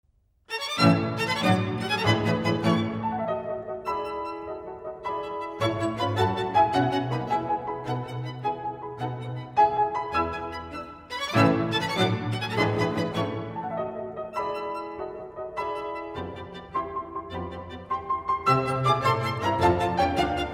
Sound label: schubert trout quintet